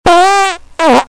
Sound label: fart